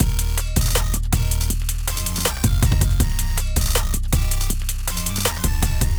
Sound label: Drums And Bass, hyperpop beat